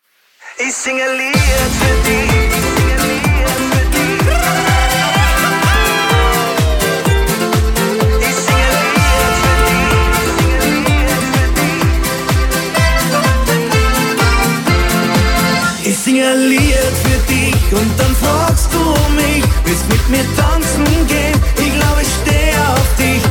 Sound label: disco megamix